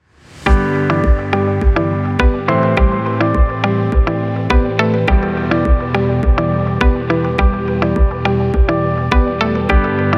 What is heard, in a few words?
calm, ambient, inspiring